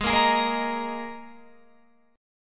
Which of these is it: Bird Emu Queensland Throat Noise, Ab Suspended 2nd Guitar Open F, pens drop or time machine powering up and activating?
Ab Suspended 2nd Guitar Open F